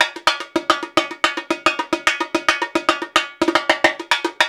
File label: Rio Batucada, 120; Spectrasonics; Supreme Beats; World Dance